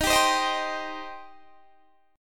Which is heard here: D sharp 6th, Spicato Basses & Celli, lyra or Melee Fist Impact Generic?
D sharp 6th